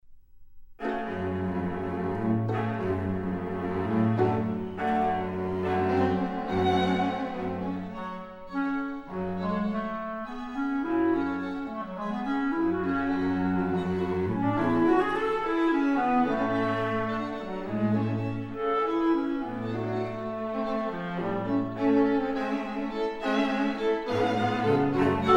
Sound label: quintet; Coleridge-Taylor; 1st Movt